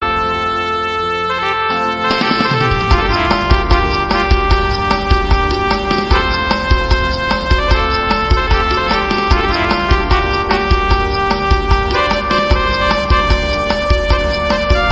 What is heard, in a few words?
instrumental piano